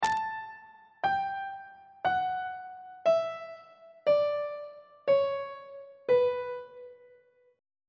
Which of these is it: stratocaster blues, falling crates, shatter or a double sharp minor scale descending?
a double sharp minor scale descending